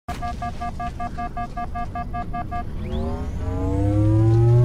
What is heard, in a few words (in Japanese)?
シールドチャージ; HALO